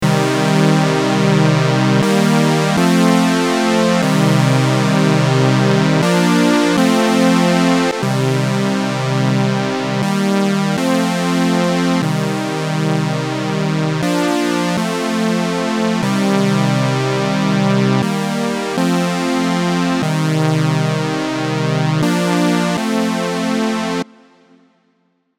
Tremolo Klangbeispiel

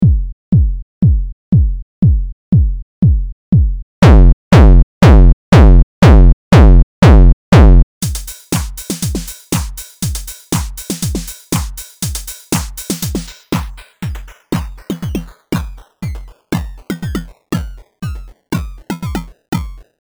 Verzerrer Drums Kick Loop Gain Bitcrusher

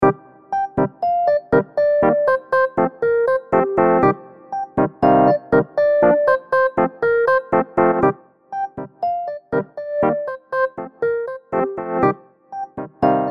Tremolo Klangbeispiel

